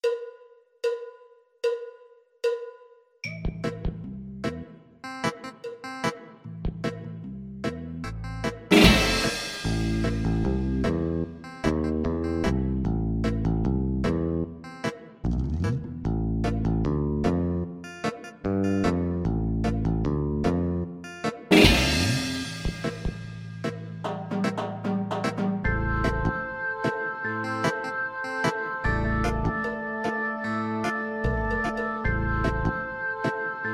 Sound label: reggae